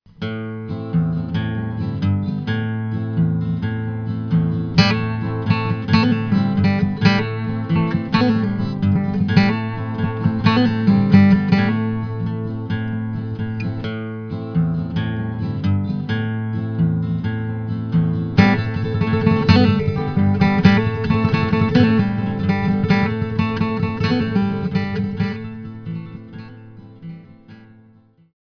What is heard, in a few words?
bouzouki style